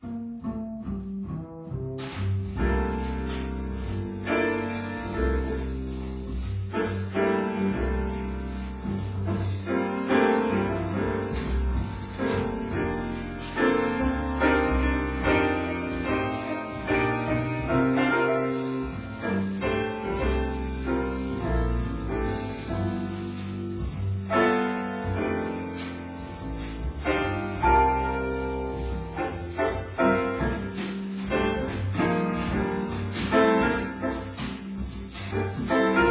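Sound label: Music Ambient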